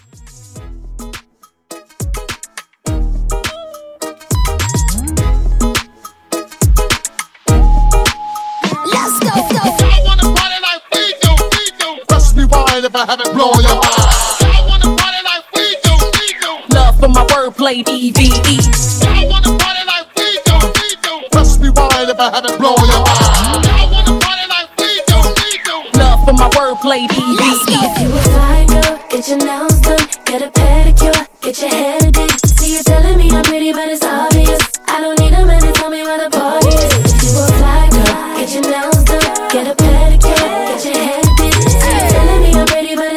Mixshow Hype 104

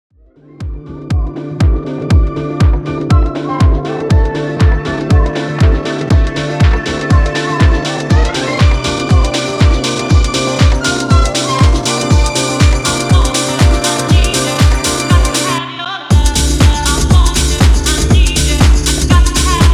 ReDrum 120Bpm

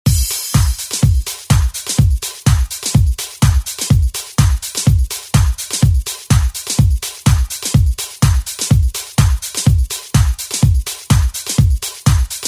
Oldschool Redrum; Deejay Hit

